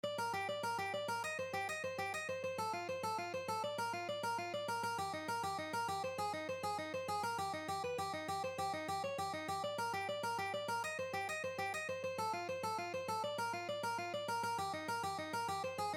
Alternate Picking Exercise, guitar

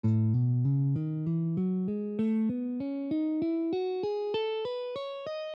A Lydian

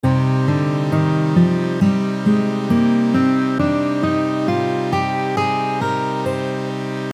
C minor Pentatonic How it sounds like

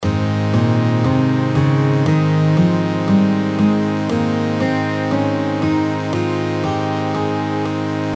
A major Scale Shape, guitar

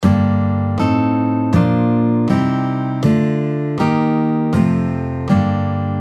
G minor Chord Scale, guitar

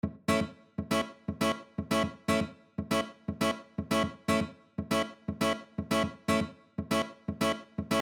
Rhythm, guitar

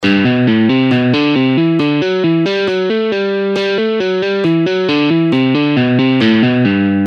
Exercise Half Speed, guitar

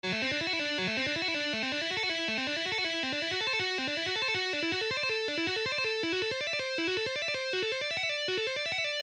Am6 Licks, guitar

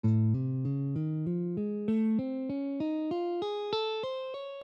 A Augmented

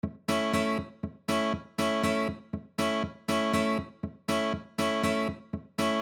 Rhythm, guitar

